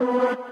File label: lead